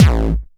Bassdrums Hard Kicks; Vengeance Samples

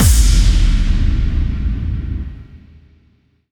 FX Boom

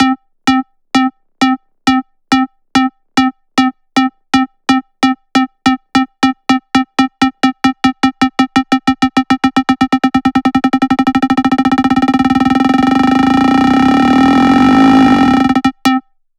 128BPM Accerlator SQ C, Melody Fills; 8Bars; Vengeance Samples

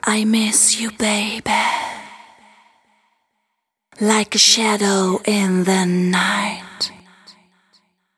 Whisper

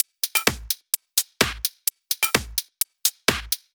Drumloops 128BPM

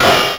Arcade Riffs

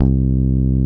Basses C; Vengeance Samples

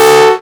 Synths F#; Vengeance Samples

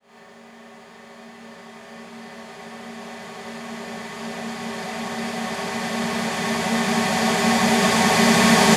Reverb Athmospheres, FX Vocals; Vengeance Samples

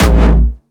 A#1, Jumpstyle Kick; Vengeance Samples; VEC3 Bassdrums; VEC3 Jumpstyle Multis; Jumpstyle Kick 8

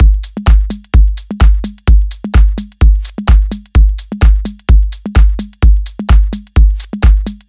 128BPM Drums; Vengeance Samples; Zeta Kit

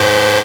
Synths G#; vengeance essential club sounds-3

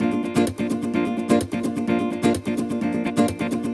Nylon Guitar A# maj, 128BPM; Vengeance Samples; VEH3 Nylon Guitar Kit 1

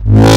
Synths C; Vengeance Samples

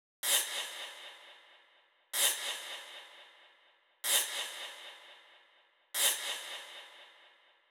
126BPM Cutted, TechHouse; Offshore; Vengeance Samples; Bonus TechHouse Kits

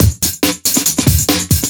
Breakbeat; Vengeance Samples; VEC2 Loops

